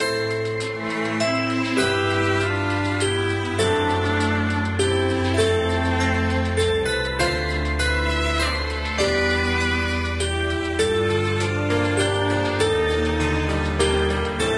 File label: Gameover; Ruu Goblin Quest